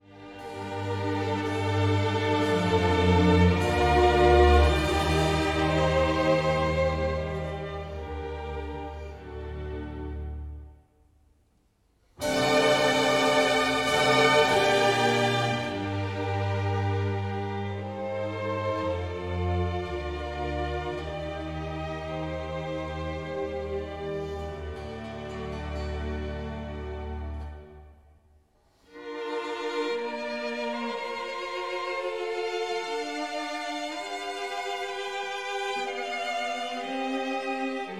Concerto Grosso In G Minor Larghetto E Affettuoso; Handel; Concerto Grosso 6; Op. 6 6; HWV 324

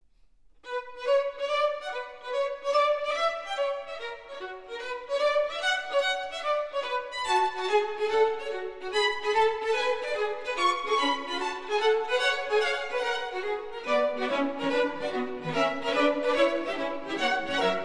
Concerto Grosso In B Minor; Handel; Concerto Grosso 12; Op. 6 12; HWV 330

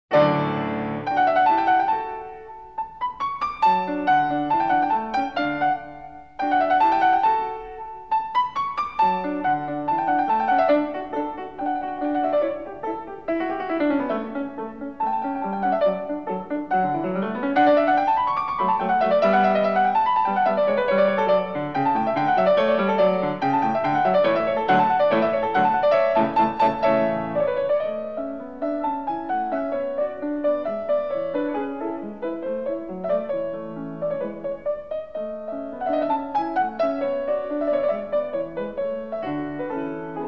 Piano Sonata in D major Allegro con spirito

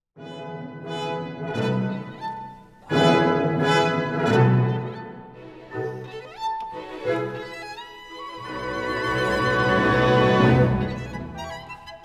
Violin Concerto In E Minor; Mendelssohn

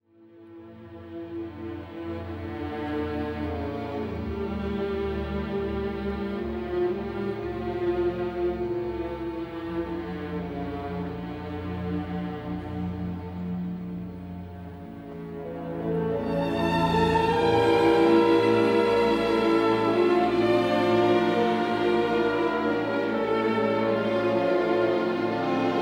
Piano Concerto In D Minor Intermezzo Adagio; Rachmaninov; Piano Concerto 3; Op. 30

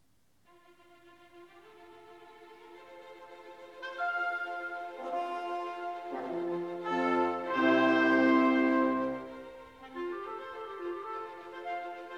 Symphony in A Minor Vivace non troppo; Symphony No. 3; Op. 56; MWV N18; Scottish

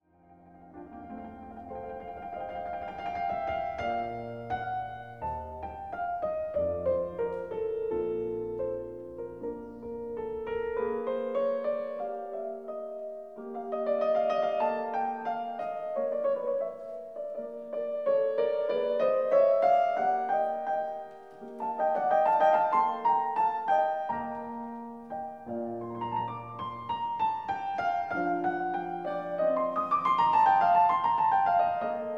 Concerto In E Flat For 2 Pianos Andante; Mozart; K 365

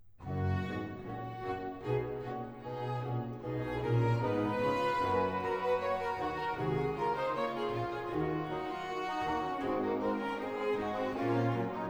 Mass In B Minor Credo Et In Unum Dominum; BWV 232

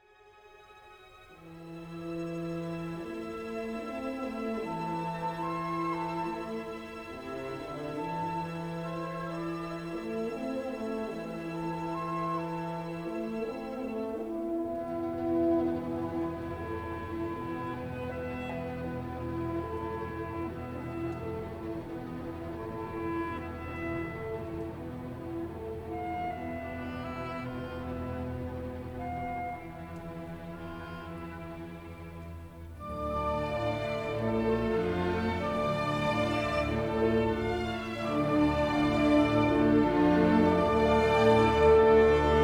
Symphony In D Minor Feierlich Misterioso

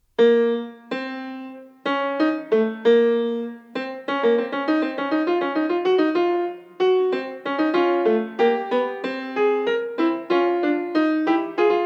Prelude Fugue in B flat minor Fuga; No. 22; BWV 891

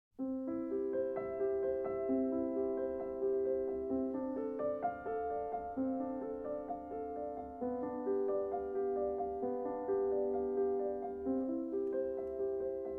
Prelude and Fugue in C major

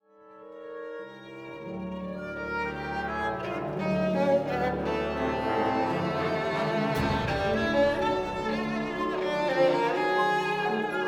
Violin Concerto Andante Allegretto; Berg

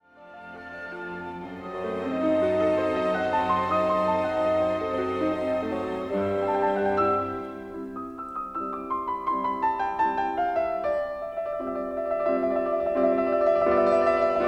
Piano Concerto; Mozart

